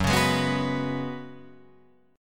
F sharp Suspended 2nd Suspended 4th, Guitar Open F; strummed; JGuitar